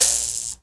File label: MOUTH BOW, Heart of Africa